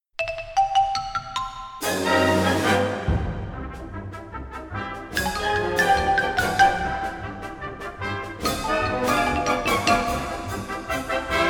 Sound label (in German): xylophon kapriolen